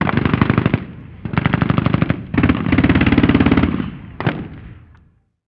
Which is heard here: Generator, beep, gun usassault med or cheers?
gun usassault med